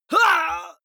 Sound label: male fight pain shout; vocals; comedy-cartoon; soundpool